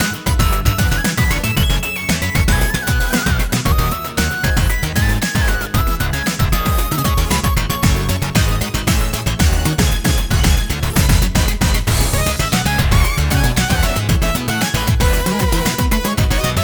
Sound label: Base Loop